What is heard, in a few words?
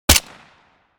MK2 assault rifle single shot; war; gfxsounds